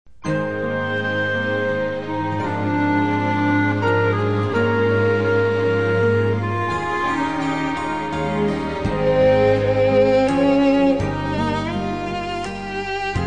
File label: Instrumental Praise